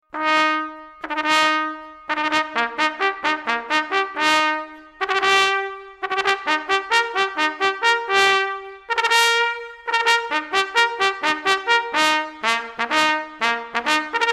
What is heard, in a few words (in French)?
Sonnerie A L Entendard